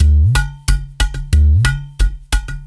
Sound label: Udu (90BPM); algorave